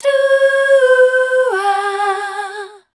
MEL C2, Voice Spectral, MELODIC; Best Service

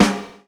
SD JAZZ; E-Lab; X Static Goldmine 2; akai

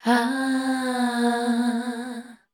C, Voice Spectral; MELODIC; Best Service; Voice Spectral II

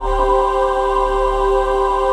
VOICE PAD; Best Service; XX Large; Pads

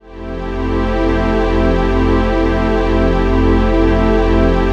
PHASE PAD, ATMO PADS; Best Service; XX Large

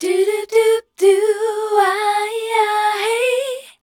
MELODIC, Voice Spectral; Best Service; Voice Spectral II

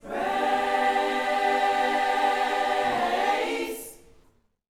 Vocal Gospel PHRASE

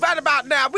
VOC

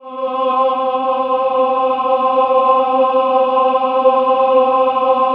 CHOIR; Best Service; Robot Computers Akai

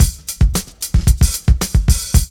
LOOP; E-Lab; X Static Goldmine 2; akai

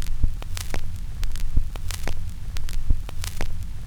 CRACK; E-Lab; X Static Goldmine 2; akai

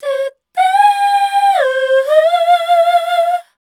MEL C2; Best Service; Voice Spectral II

